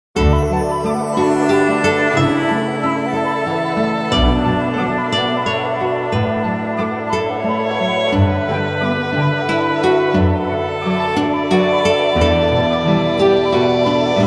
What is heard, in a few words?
Tranquil clock alert; Nokia; Audio themes